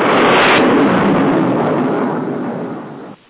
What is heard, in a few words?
Missile Fired